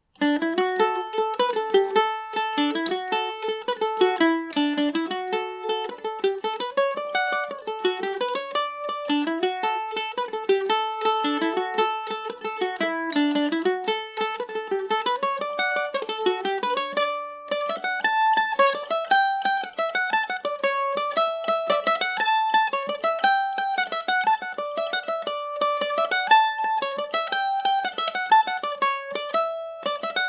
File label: bat fowling g and o, mandolin; G&O #34; Listen to me playing the tune in January 2021 on my G&O #34 here